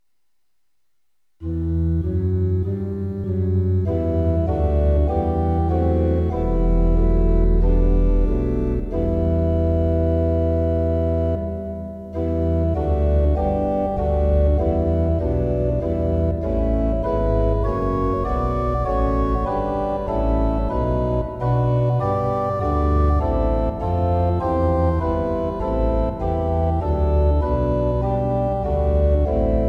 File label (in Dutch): Orgel; Kom en volg mij op de weg